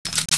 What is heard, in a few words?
slide; brainbread